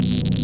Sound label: super beam